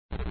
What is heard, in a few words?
move bot; tankz; InfernalGames